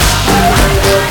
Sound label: HIT 2 LOOP; Big Beat Samples & More; AKAI; ALL LOOP KIT